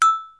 RollOver Sound

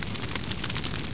torch

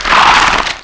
Come Rocas Death; Grounch

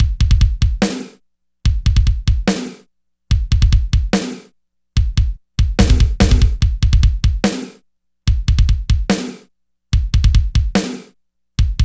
drum loop; maudio

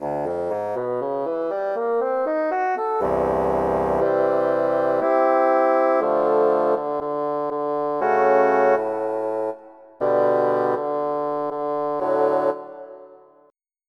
Bassoon; EverQuest

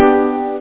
F MAJ Piano

